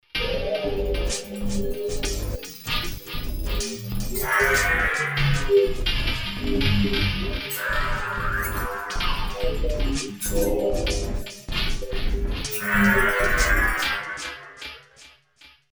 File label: perc weird, Alesis qsr synth